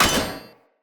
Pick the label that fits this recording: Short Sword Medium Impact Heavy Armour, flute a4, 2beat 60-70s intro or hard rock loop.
Short Sword Medium Impact Heavy Armour